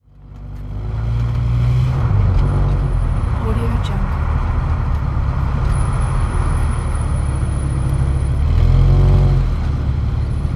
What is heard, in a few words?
inside a car; yellowcatt